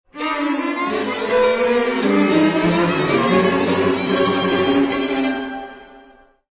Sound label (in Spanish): cuarteto de cuerda; Witold Lutosławski